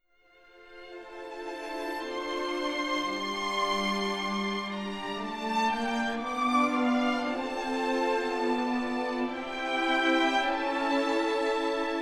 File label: Concerto for Trumpet and Orchestra Allegro